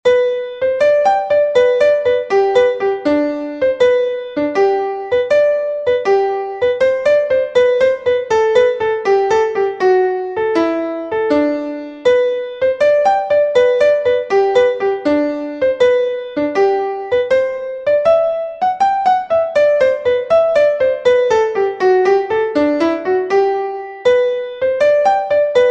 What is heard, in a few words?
Hornpipe in G Major for Whistle; The Sunshine Hornpipe